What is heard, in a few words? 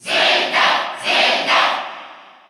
Cheer Russian; Zelda; SSBU